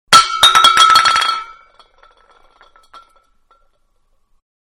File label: Aerosol Can Fall On Concrete; Spraying; Can Aerosol-On Concrete; Objects